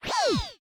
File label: spider boss attacked; unsighted; enemies